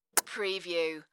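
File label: Quick Kiss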